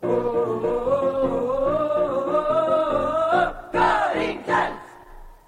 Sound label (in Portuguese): coral radio; corinthians; radio globo